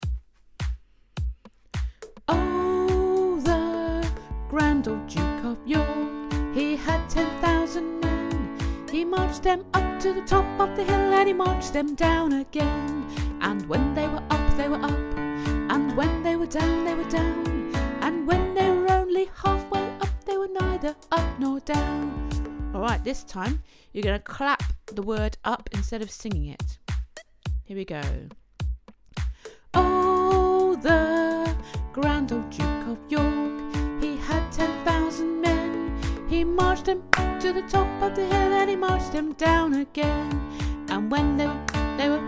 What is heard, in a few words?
Warm Up Grand Old Duke